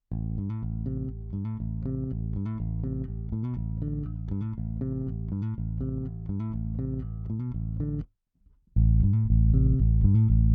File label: Rickenbacker Finger EQ flat; ultraLo UtraHi boost1-5